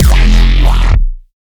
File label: Growl Bass; neuro; BIG5AUDIO